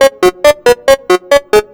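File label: synth 138 F#, hooklines, Techno; Techno ID; instrument-loops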